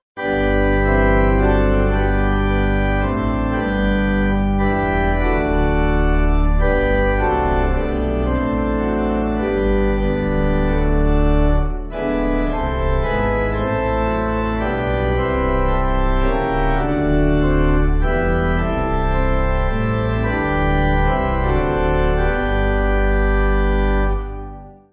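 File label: Pipe Organ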